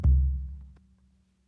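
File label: Calm Far, music; from dust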